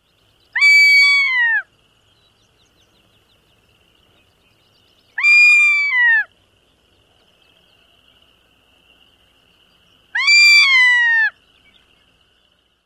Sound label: call